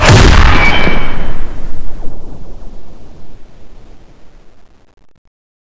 piano gun, weapons

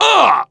human die; wolfteam

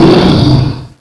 skill start

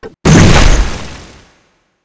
dragon sword slash, weapons

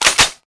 bolt pull, weapons

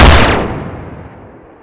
alteration ammo gun, weapons

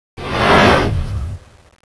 grasp, tentaculo; CSO KrakenBoss

